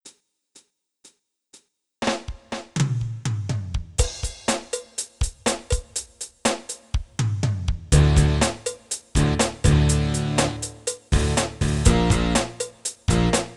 bandora creator rock power sync timbres of heaven

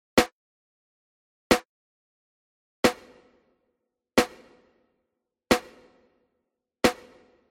small vocal concert reverb; Lexicon PCM Native

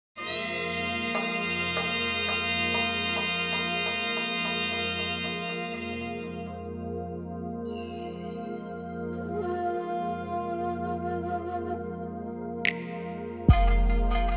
korg kross 2 the far east

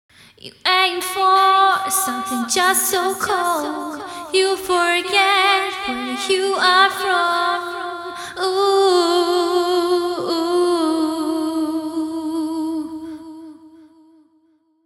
VOCAL WET

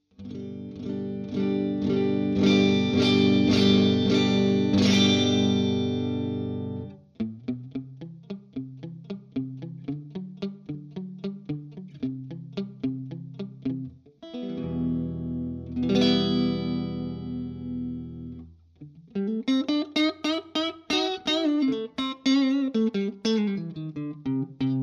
strat 20db boost jtm45; vovox sonorus protect a350 ts-ts test

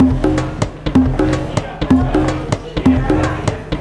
tribal perc 126; Wave Alchemy Loop Tools and Grooves

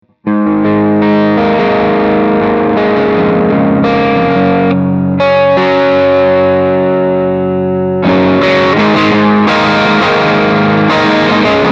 crunch; harley benton hb 35plus

